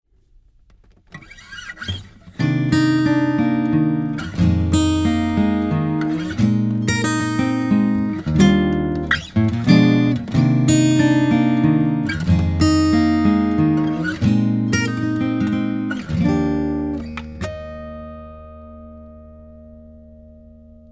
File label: lct 540s acoustic guitar; lewitt